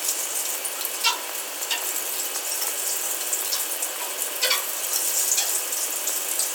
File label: boiler filtered